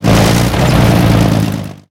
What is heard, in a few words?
pt76 engine load, engines tanks; War Thunder